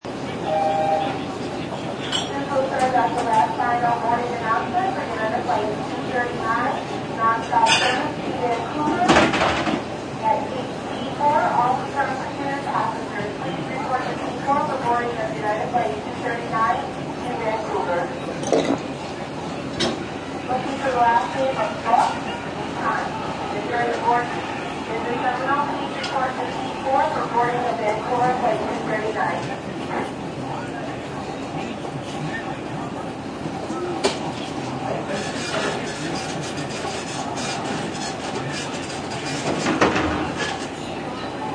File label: Airport Cafe, Airport café ambience; Airports